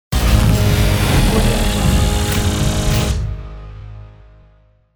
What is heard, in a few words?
emp charge